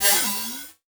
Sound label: Multimedia Internet CD-Rom Flash Hi-Tech